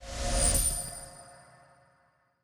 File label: item showcase coin; ui